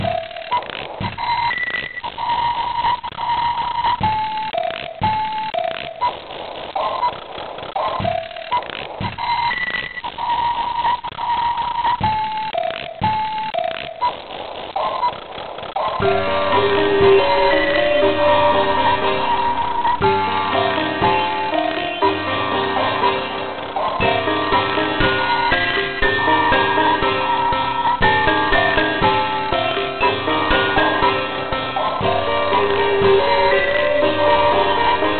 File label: drone